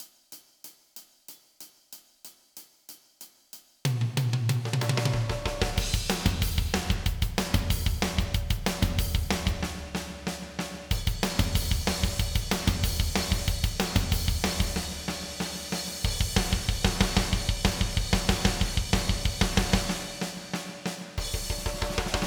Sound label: drum 80s